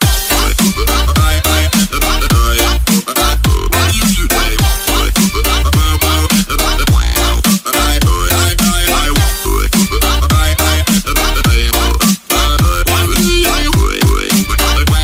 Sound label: Mobile Ringtone Funny Dance